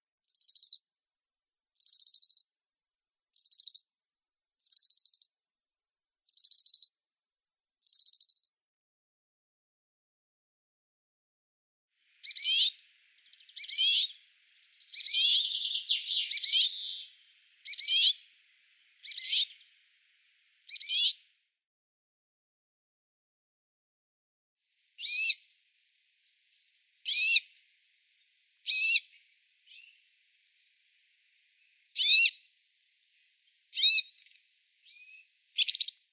European Serin calls

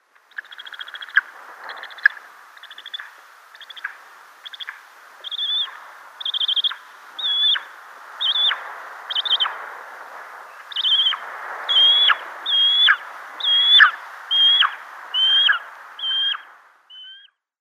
Spanish Imperial Eagle nestling

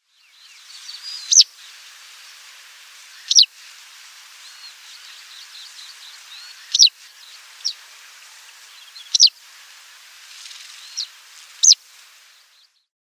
Citrine Wagtail calls

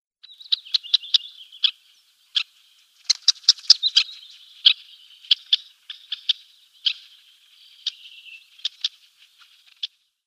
Red-necked Phalarope calls

